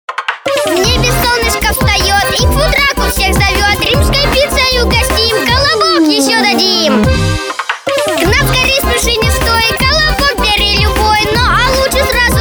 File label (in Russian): vokal chastushki